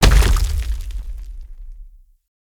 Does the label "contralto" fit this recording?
No